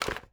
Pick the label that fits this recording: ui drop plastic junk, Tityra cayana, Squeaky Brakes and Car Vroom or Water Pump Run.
ui drop plastic junk